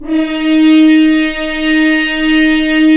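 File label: french horns, swells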